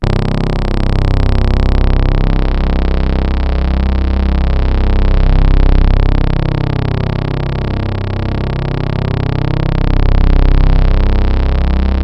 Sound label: SYNTH LE; MORPHEUS; Syntec; Wall of Sounds